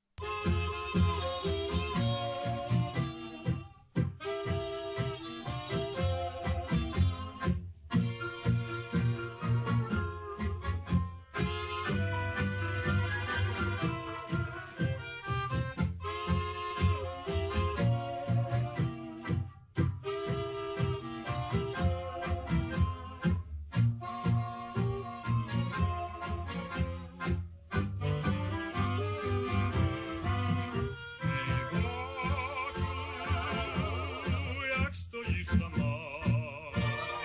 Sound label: Ukrainian tango; Stepan Huminilovych